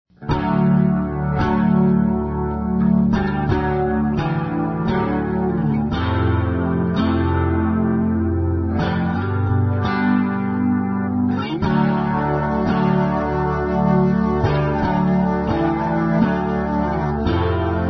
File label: bass